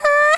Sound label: cry D5; BanG Dream; Anon